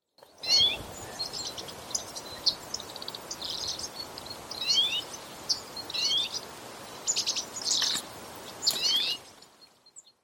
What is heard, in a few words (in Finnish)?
Urpiaisen kutsu; linnut